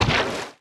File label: mech armor land wet; Factorio